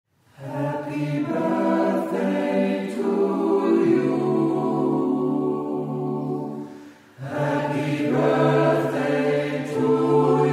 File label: Happy Birthday My Sweetheart